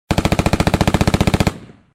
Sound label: Gun Fire; Mars Sortie (Arcade) (gamerip) (2018)